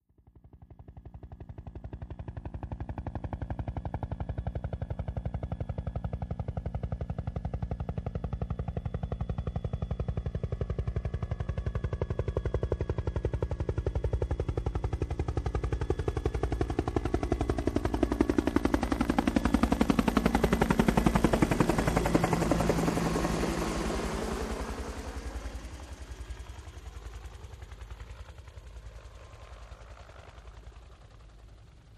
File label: UH1 Huey By Slow Speed